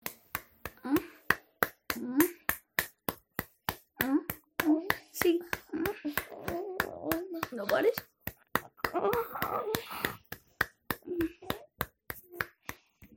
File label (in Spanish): gemidos ricos